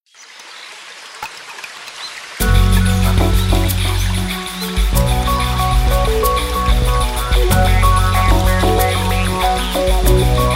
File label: JINGLES